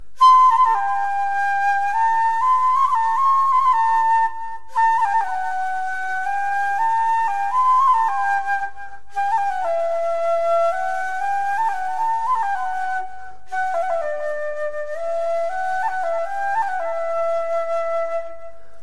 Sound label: Ney